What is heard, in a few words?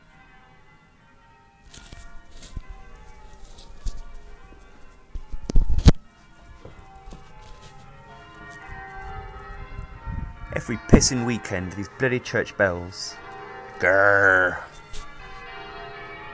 bell